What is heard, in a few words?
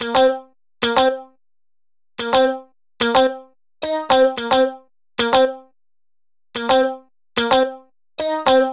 Funk Guitar G# 110Bpm, House; Ghosthack Advent Calendar; House Kit; House Construction Kit